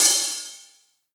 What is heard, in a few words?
Acousti Hat Hit, hihat; ultimate hihat; Hits